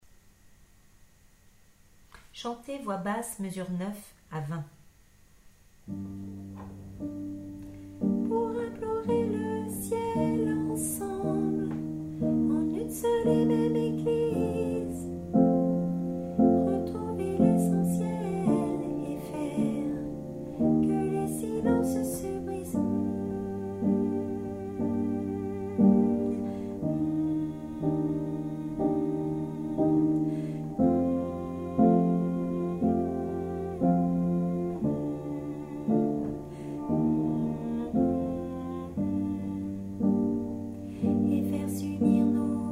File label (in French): chanter basse